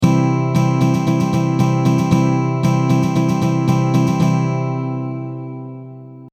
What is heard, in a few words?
stroke16 A115